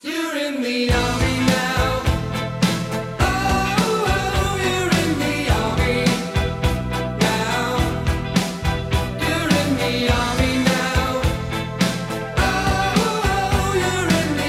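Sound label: classic foreign rock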